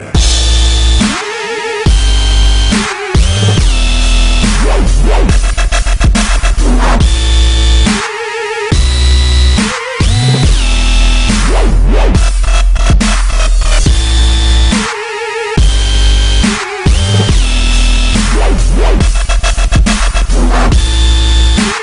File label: russian lullaby, ringtones electronic